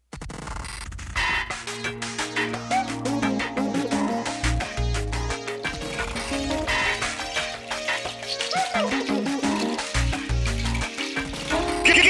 vinheta; clube do groove

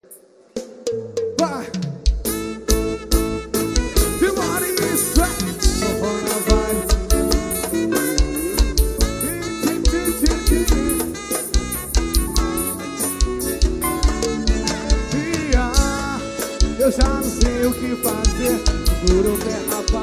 forro

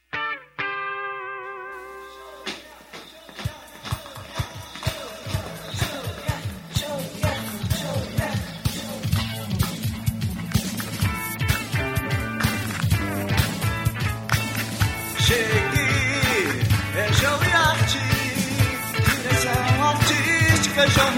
jingle criação musical

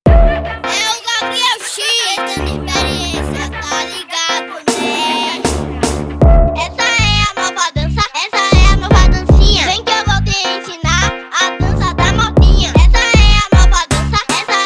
remix brega funk

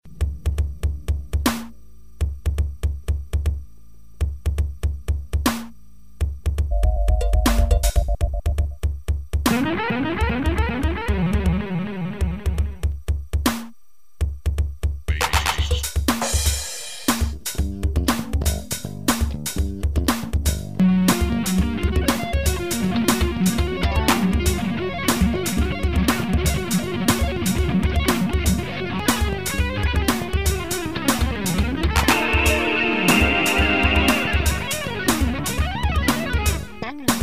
pagode alto astral

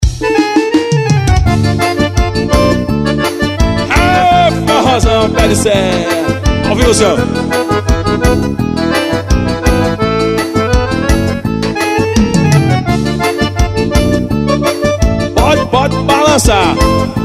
promocional ao vivo na festa; festa de sao marcelino champagnat

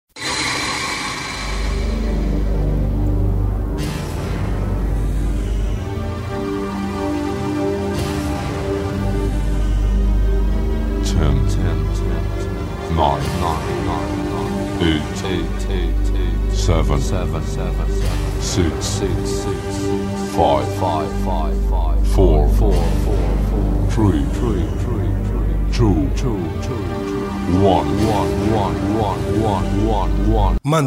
toque de celular

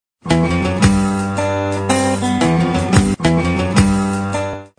rigtone suave

